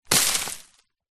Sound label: tree branch small leaves fall from tree hit ground; foley; zapsplat